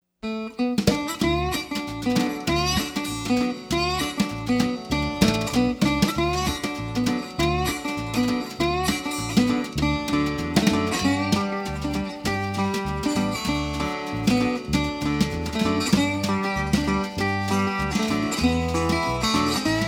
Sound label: Guitar Rag